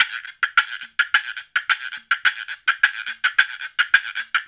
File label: Gua Guanco